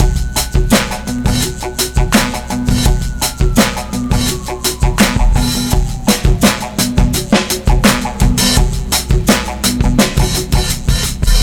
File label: DRM, Breakbeat; Best Service ProSamples vol.24; AKAI; SWEET 16